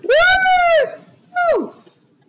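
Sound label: female sound "ueeeee hu"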